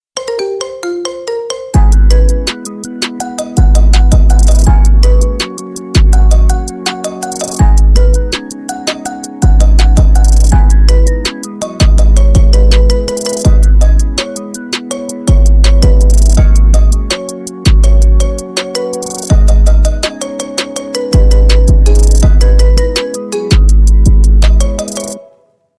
marimba remix

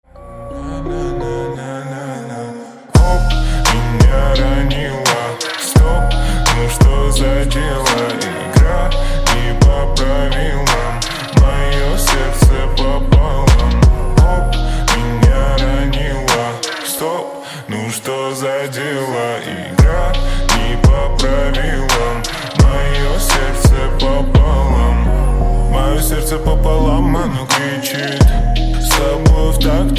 slowed reverb

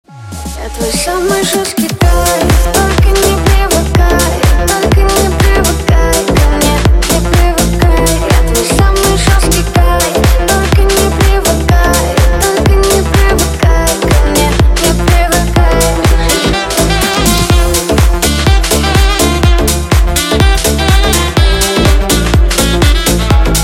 sax remix

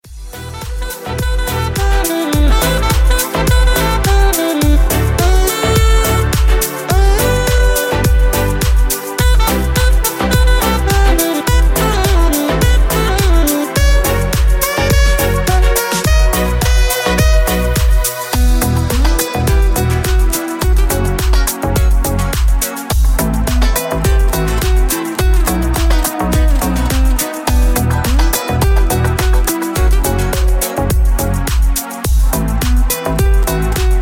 instrumental

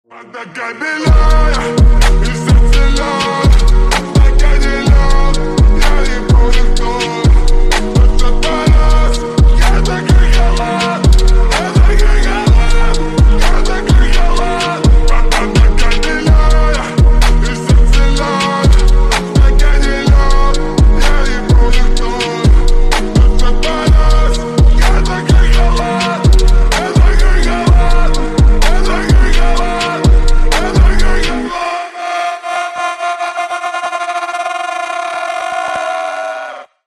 slowed reverb bass boosted

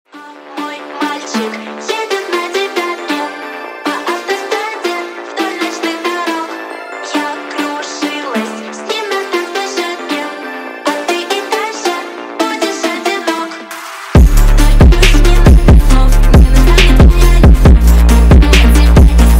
phonk remix

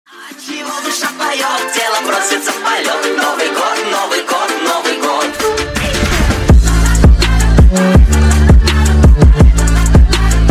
trap remix

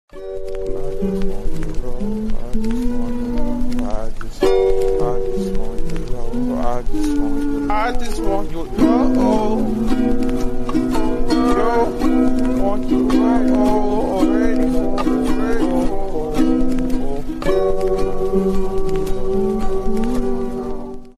slowed reverb

